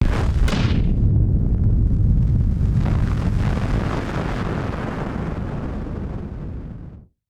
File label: Firework FX; musicradar; bomb factory